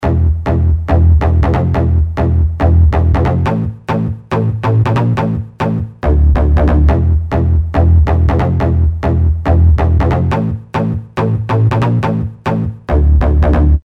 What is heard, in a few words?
Chunder Bass, Industrial